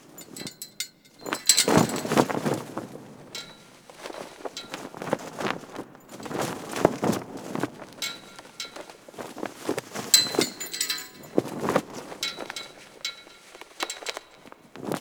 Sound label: Flag Loop Medium; Ambience; Props; Warframe; Eidolon Plains